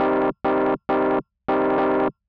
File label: Overdrive